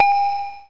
ding

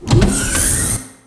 lid machine; xatrix; world

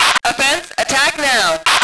radio; lmctf; andromeda

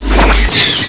pain; alien

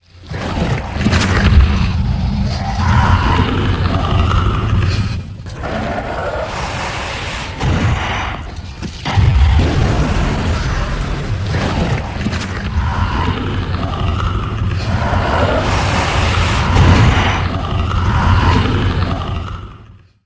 highway forest monster

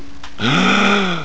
gasp

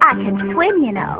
gurp; mpitstop

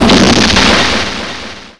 cannon; gunslinger

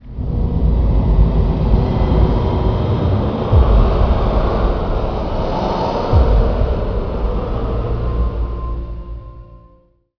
umbrella; spawngirlsounds

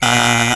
pain

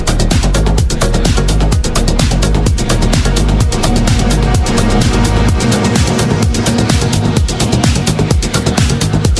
future city music

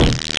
bump; openffa; fuel

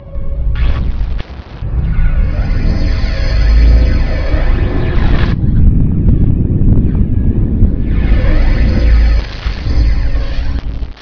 grind

